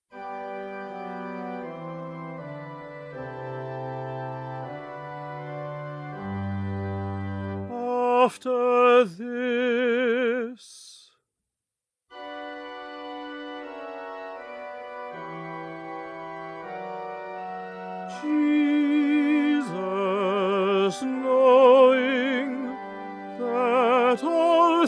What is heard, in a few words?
1st Tenor